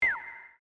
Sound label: chime